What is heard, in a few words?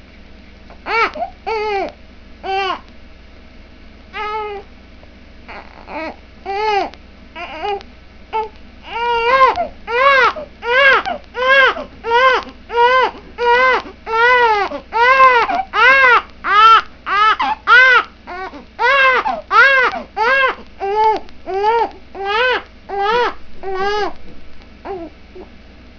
Cry